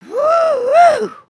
stink; emotes

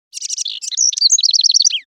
Jungle Bird

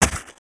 Jungle Banana Smashable; gnarled forest

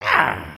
Walk, creatures organic

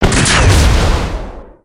Pocket Cannon

